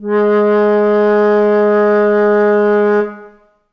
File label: flute acoustic